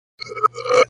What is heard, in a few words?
burp, reverse